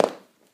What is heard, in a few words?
Landing; Hunters and Props